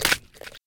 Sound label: Unstuck kitchen knife; Zomboid 41.78.16; Project Zomboid